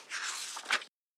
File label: paper